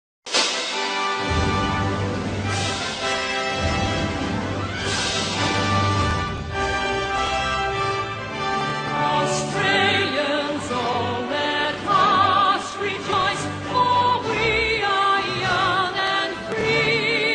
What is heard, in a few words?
advance australia fair vocal